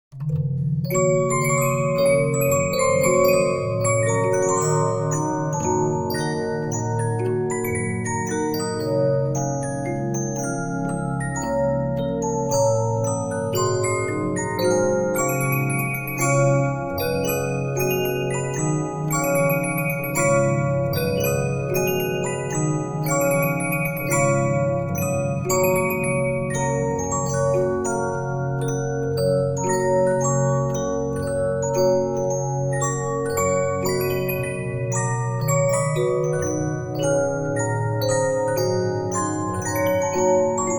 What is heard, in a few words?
Regina, 15inch disc musicbox